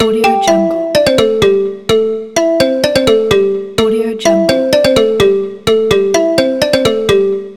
ringtone

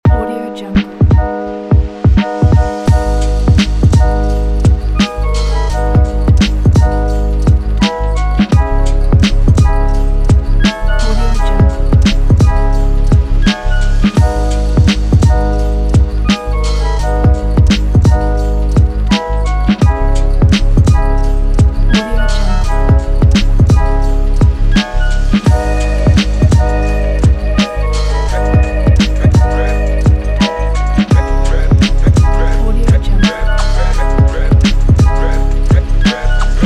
urban hip hop

